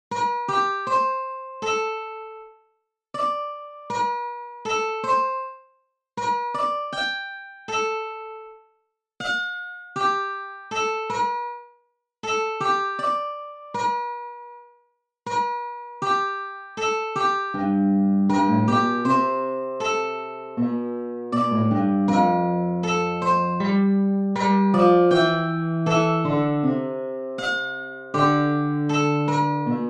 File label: Enigma in Maqam Rast; Keenan Pepper